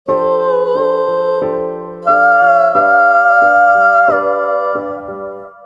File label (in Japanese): hamori semitone